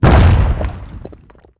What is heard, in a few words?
stone form; proj impact; the monster plane; kolmods